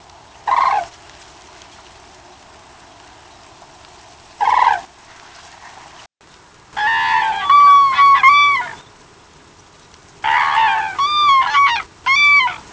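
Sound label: call, Grus grus, Crane, flight calls and ground calls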